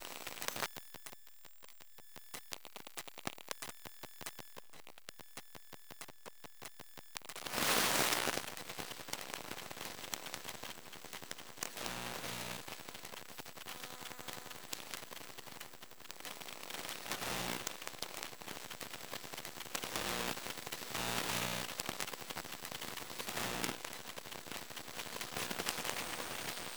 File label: Electromagnetic Smartphone Sequence; Mono; Essentials Series NOX SOUND